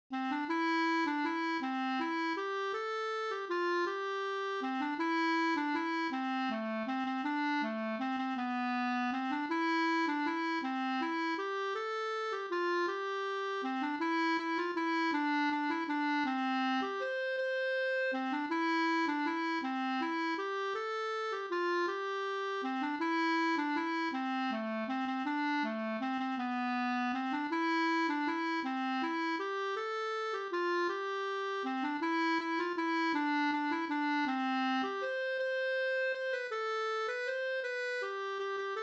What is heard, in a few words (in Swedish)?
vals C dur